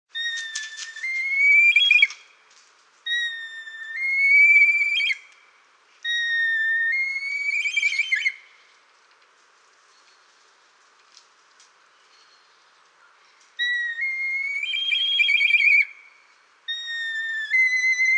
kania ruda

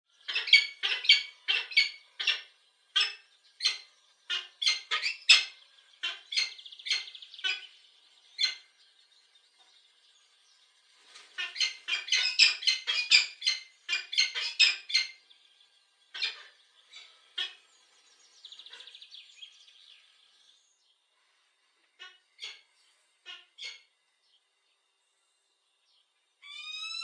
sokol wedrowny

